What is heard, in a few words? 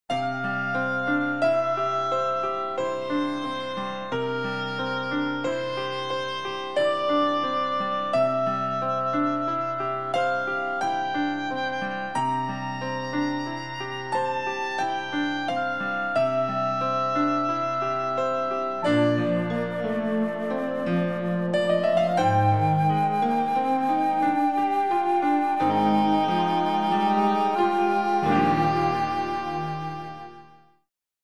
Easy Listening & Classical; Sound Ideas